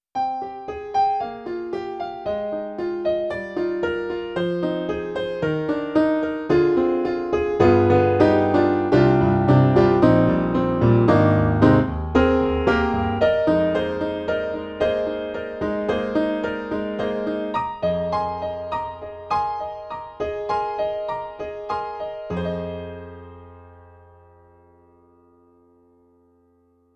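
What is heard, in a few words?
Concert Piano; E-MU; Holy Grail Expansion ROM; Concert Pno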